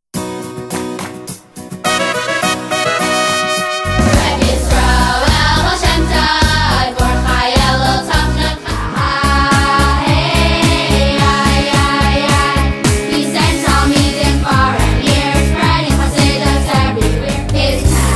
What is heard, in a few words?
Rabbeim Songs